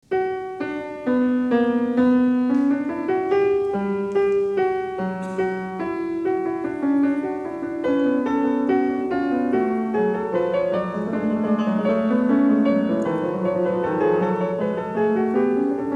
Well Tempered Clavier Book II Prelude & Fugue in b; JS. Bach